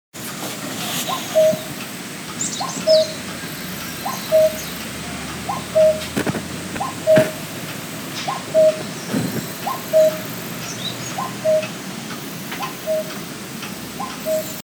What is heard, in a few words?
voice cuckoo